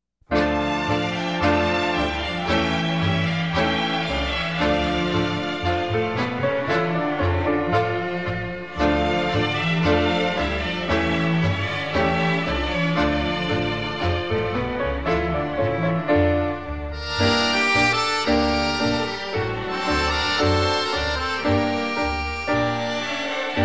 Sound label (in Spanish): Violines, Tango, Instrumental; Argentina